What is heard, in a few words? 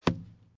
knock; chicken